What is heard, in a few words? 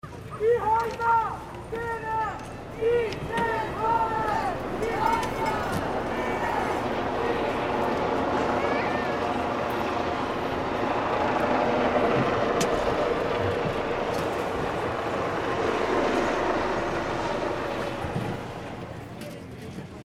atmo loop copter